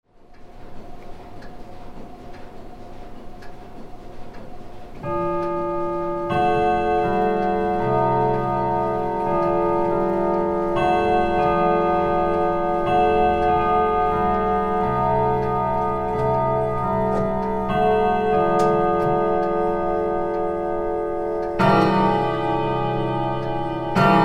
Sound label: clock; 柱時計; 人間発達文化学類と共生システム理工学類の正面玄関に設置された柱時計の鐘．何故か，正時ではなく２分に鳴る．